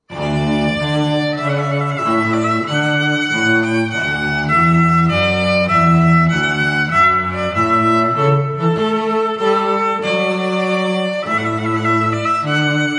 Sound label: Trumpet Voluntary; Jeremiah Clarke